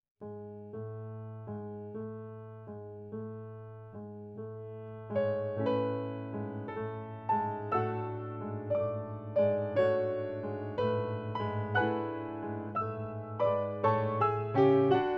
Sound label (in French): Valse; Marie Jael